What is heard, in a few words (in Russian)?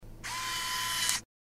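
зум увеличение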